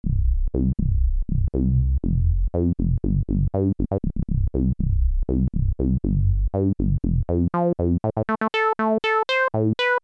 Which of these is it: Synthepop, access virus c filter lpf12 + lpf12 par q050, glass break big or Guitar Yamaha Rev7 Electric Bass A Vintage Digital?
access virus c filter lpf12 + lpf12 par q050